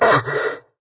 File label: redout short; War Thunder